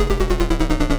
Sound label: tone mod; 1sec; tron